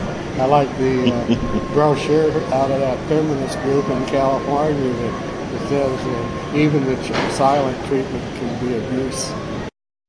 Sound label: silence